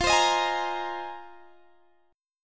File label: F Minor 11th, Guitar Open G